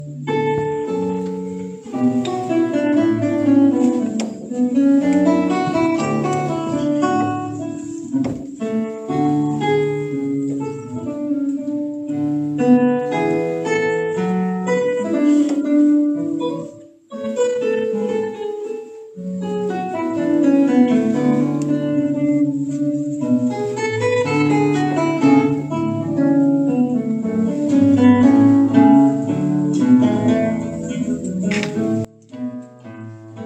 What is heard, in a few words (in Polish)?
gitara Bach, Recitalu gitarowego